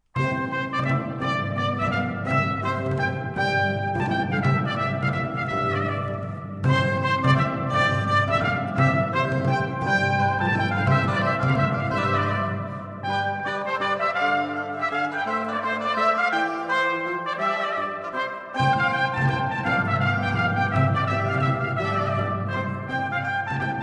Menuet Pour Les Trompettes; Lully; Les Grandes Eaux Musicales De Versailles

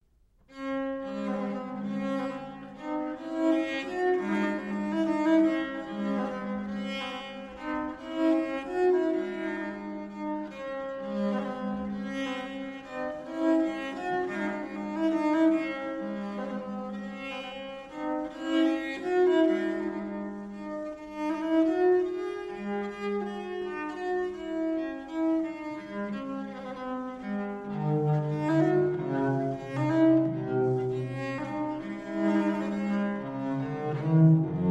Pièces de violle, Suite in D Minor, Menuet; Musique pour la chambre du Roy

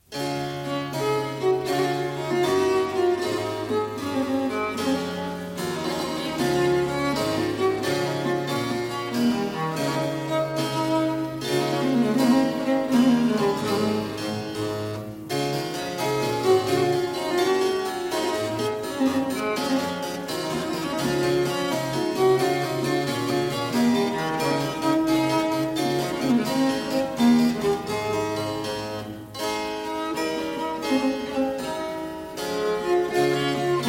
Suite en Re majeur pour basse de viole et clavecin, Gigue; Marais

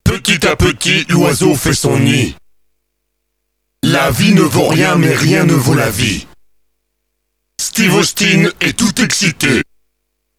Accapella

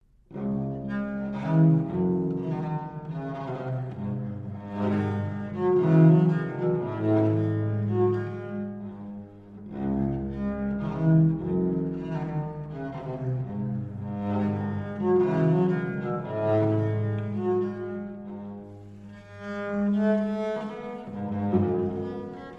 Pièces de violle, Suite in D Minor, Gavotte; Musique pour la chambre du Roy

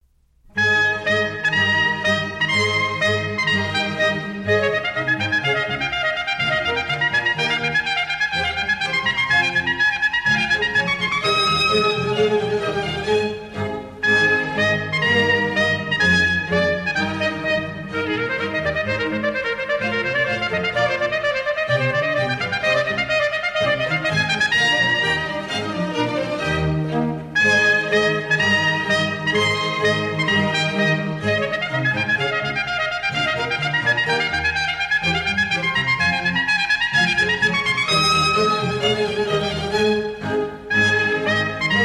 Concerto pour trompette et cordes en re majeur, Allegro; La Trompette D'or

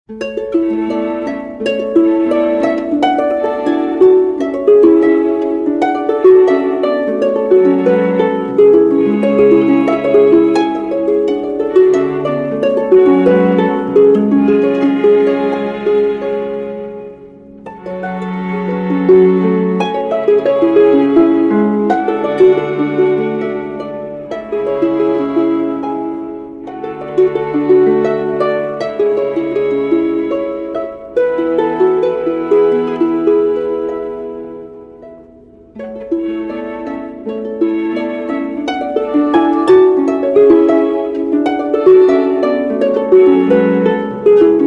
Ballade pour flûte et harpe; Jacquou le Croquant; bande originale de la série télévisée; Georges Delerue

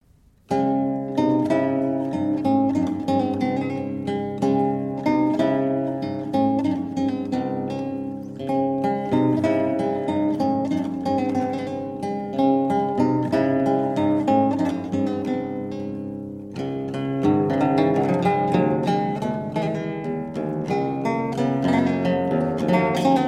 Suite pour theorbe in D Minor, Menuet; Musique pour la chambre du Roy

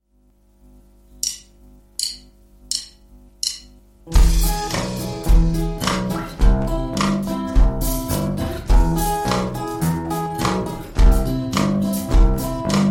Morceau en son clair (Acoustic)

